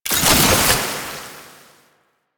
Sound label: Alchemy Grenade Pickup Toxin; Warframe; Entrati